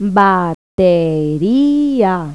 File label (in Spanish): BATERIA; Pipo-Aprende musica con Pipo